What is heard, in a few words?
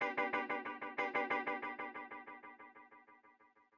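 guitar; Sonic Foundry ACID Music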